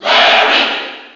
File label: Cheer; Larry Koopa; SSB4